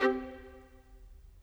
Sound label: Violin